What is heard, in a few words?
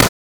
fire, glock; Urban-Coalition; Decay; arccw ud